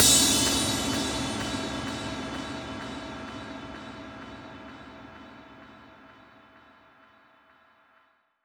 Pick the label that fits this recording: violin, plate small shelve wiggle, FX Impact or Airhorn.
FX Impact